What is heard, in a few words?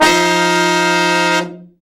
BRA SECT; BRA SECTIONS